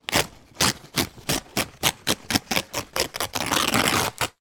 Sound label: wrapping paper rip; foley; zapsplat